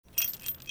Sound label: Smithy Metal Misc; Soul Plane